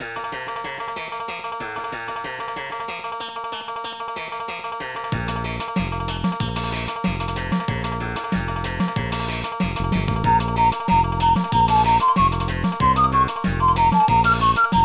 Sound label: ballad; Digital Symphony Collection; fun; jolly